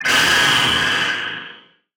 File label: attack; FRACTALGUARDIAN1